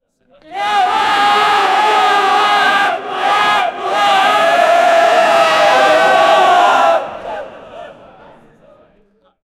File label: group of slaves beaten up, violence